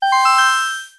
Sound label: reveal shimmer